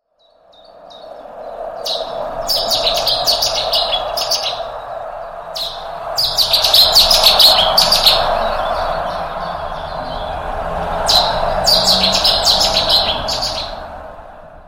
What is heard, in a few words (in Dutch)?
cettis zanger